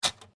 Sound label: ADDING MACHINE SIEMANS ELECTRIC 1950S SINGLE KEY, Electric adding machine; Typing; Offices